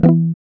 E2, Acoustic; Sound & Vision; Gigapack I CD 2; GUI ACOUST.; GUI Acoustic 1

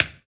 Body Perc

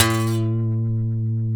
B funk, Guitars; Spectrasonics; Hans Zimmer Guitars VOL-1; TAKA FUNK

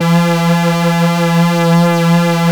3100 PWM E 3, Korg PS3100; Back In Time Records; Korg Universe VOL-1

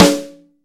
BRASS SNARE; AKAI S6000 CD-ROM - Volume 3

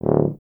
BRS TUBA, Solo Instruments

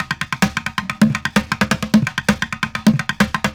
Street Groove, Ambient Grooves

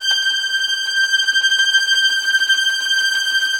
VI TREM F

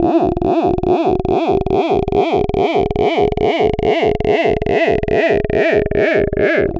Noise, LFO & Noise

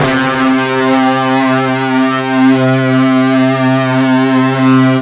FUZZ C 2 R, Guitar; East West; Steve Stevens Guitar Samples Collection

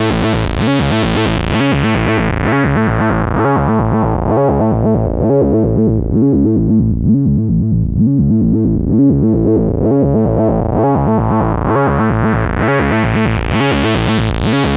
NRG Sweep, Trance

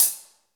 HH hh; Best Service Gigapack 2

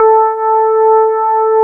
DX PIANO; East West; Twisted Textures-BT

